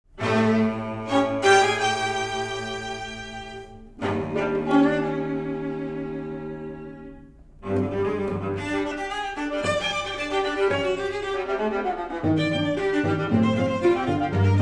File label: Quartet Presto; 5th Quartet III